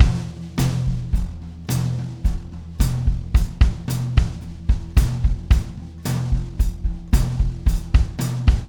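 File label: beat, Room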